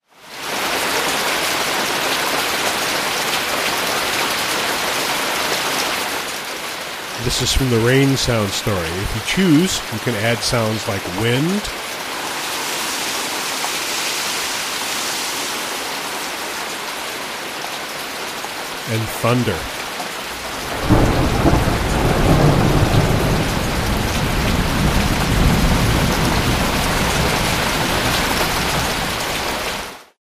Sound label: rainfall; Ecotones Sound Sleep Machine ASM1002